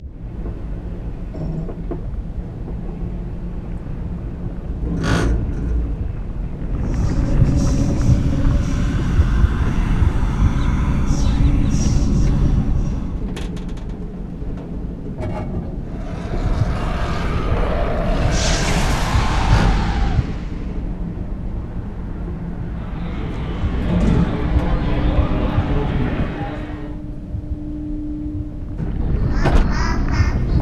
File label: Elevator Ride; Lucius III